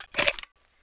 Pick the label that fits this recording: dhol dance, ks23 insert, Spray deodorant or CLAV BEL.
ks23 insert